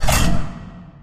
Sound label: beam emitter start; props; Source Engine; Portal 2